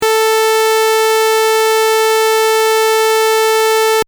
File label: saw 100 sample cycle